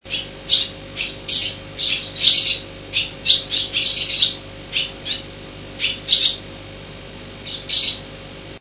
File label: Tsubamees Flying Crying, Early Morning